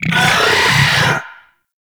Arachnoid Berserk Vocal Attack; Enemies; Vocals; Warframe; Corpus; Arachnoid Hunger

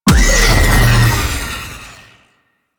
Sentient Wing Ability Cast; Warframe; Sentients; Aerolyst

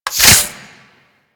Goo Gun Reload

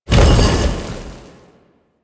Roll; Warframe; Warframes; Qorvex

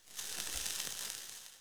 Invis Rune Active One Off; Powers; Runes

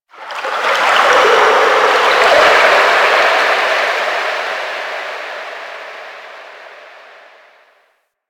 Ocean Underwater Texture Small; Ambience; Warframe; Grineer Ocean

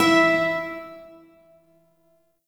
Shawzin Oct Two E; Warframe; Duviri Shawzin

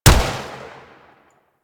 Shot; Warframe; Void Trader; Gorgon

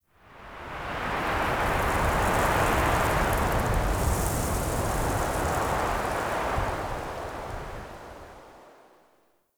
Beach Wind Gust; Midrath Beach

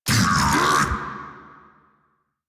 hand bomber pain; Warframe

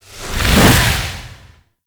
Slide Swing, Melee; Warframe; Weapons; Duviri; Incarnon Adapters

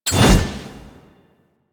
Projectile Aim; Warframe; Cephalon Weave; Weave Boss

